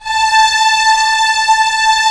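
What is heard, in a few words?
STR A0 FF, strings; Sound On Sound - Peter Siedleczek's Orchestra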